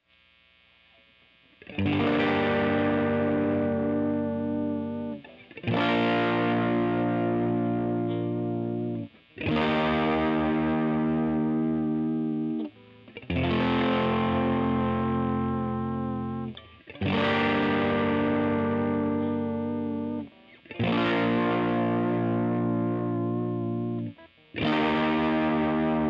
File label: r121 sus